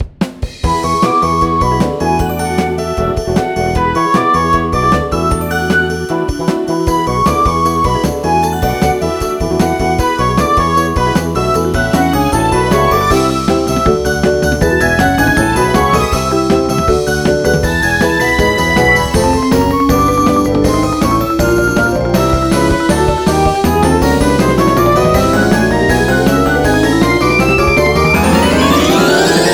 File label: battle tempo up